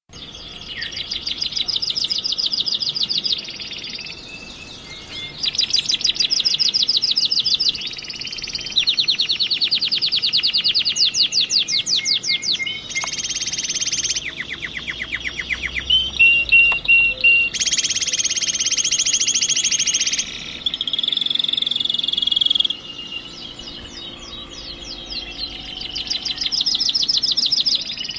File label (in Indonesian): kenari ombyokan